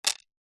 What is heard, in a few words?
household jewelry bracelet carved bone set down hollow table